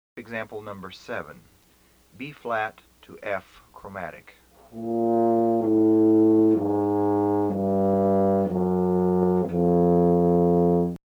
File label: Emb TUBA